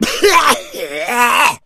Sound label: smoker pain, voice; Left 4 Dead 2